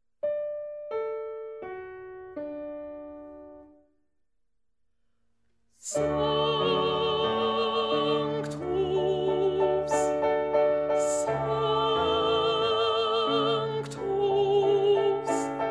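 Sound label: alto; sanctus; schola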